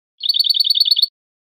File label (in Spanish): campanilleo